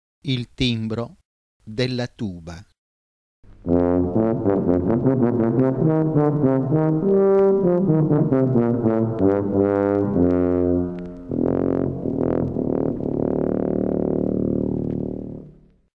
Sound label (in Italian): Tuba, il timbro della tuba